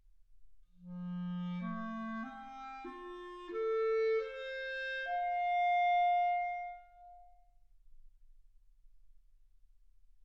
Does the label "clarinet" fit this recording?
Yes